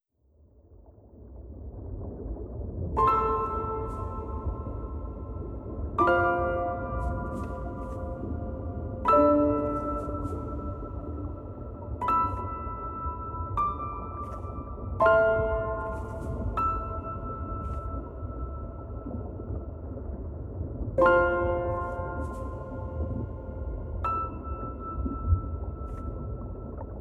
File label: Underwater Meditation